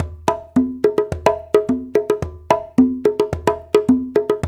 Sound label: 108 AFRO; Spectrasonics; Supreme Beats; African Contemporary